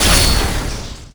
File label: Magic Spell Impact